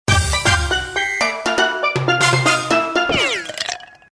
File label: Funny; ringtones; Caribbean Ice; Google; NewWave Labs